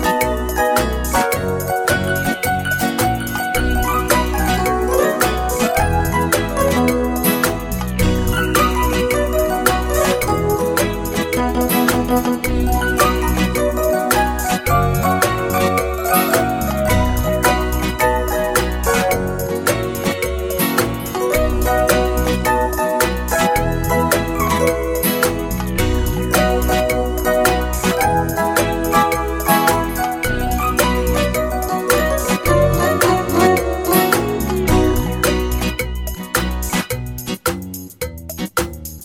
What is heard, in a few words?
Acoustic Shuffle